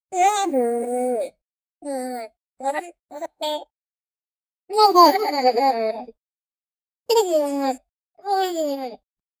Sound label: short ambient, coffee shop